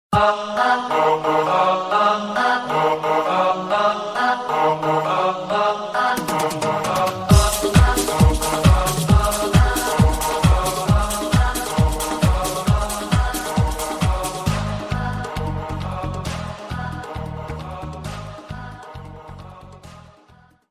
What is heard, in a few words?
remix, ringtone